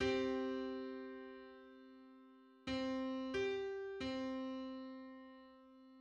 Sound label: Seven-hundred-sixty-fifth harmonic on C